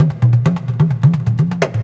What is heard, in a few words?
WATERDR 129, PERCUS LOOPS